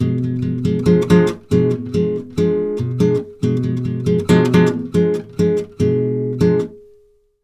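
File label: 140 guitar F DIM, Brazil Bossa; USB Soundscan; AKAI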